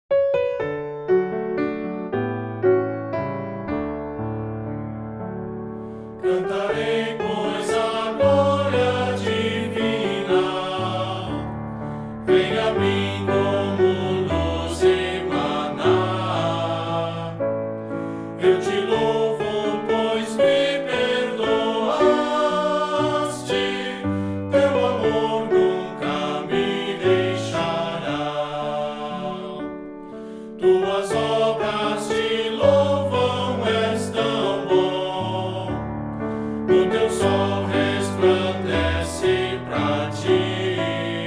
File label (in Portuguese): voz piano